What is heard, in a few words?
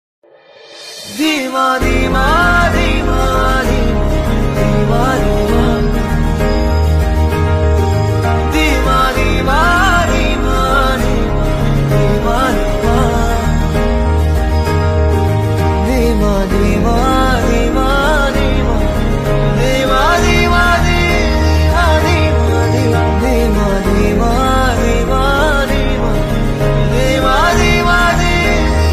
song ringtone tamil ringtone